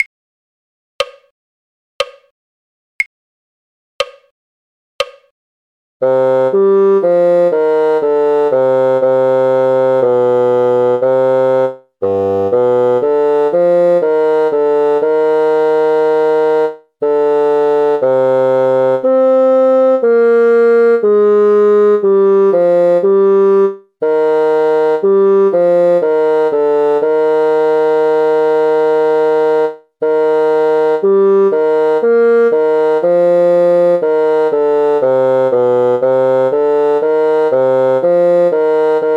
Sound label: bassoon; david of the white rock; folk